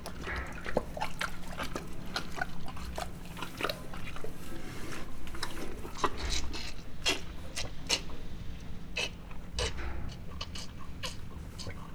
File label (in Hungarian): szurcsoles roffentes kozelrol, mangalica; miskolci zoo 2009